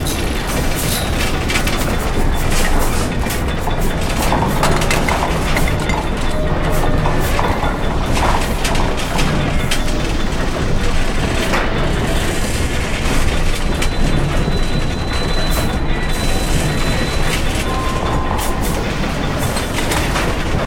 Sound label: Gears